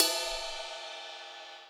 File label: Ride, dry kit